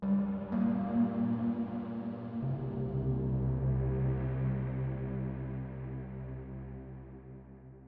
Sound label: keys, ambient